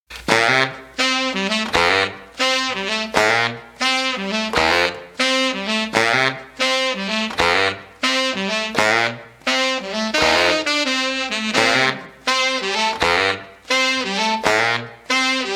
taichi sax mix

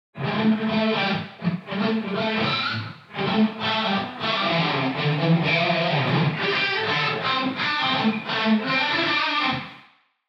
e guitar wet

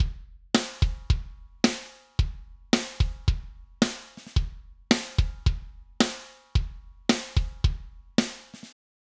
kick snare dry

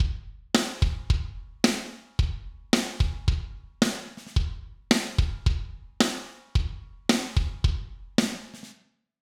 kick snare mix

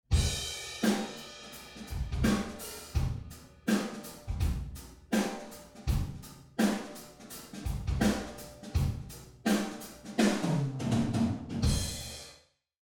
drum wet

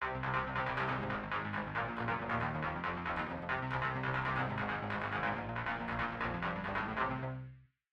synth bass wet

